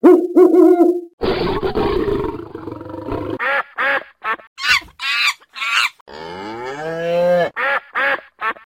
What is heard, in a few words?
string